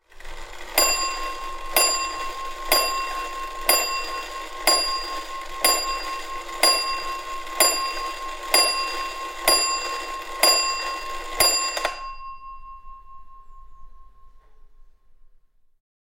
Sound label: Clock 18th Century Strikes, Churches Bells & Clocks; Hollywood Edge Historical Series